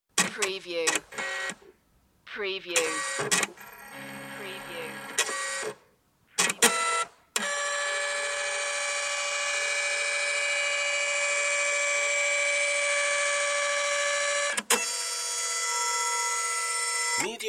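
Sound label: Scanner; Computer sound effects.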